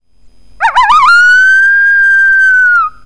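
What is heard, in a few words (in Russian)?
тоскливый вой взрослого самца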